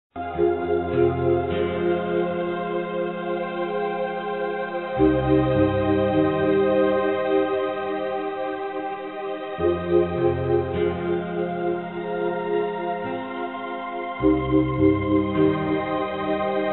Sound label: Energy Tune